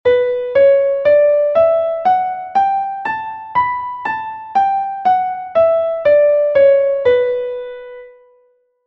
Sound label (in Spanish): si menor natural